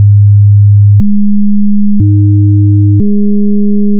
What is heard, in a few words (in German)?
schwingungen; künstlich erzeugte Audiodatei